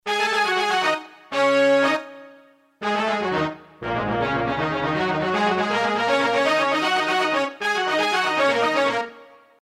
brass, korg n1r

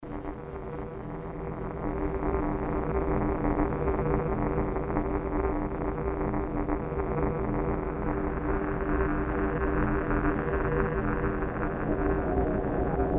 noise ring mod; kawai k1r

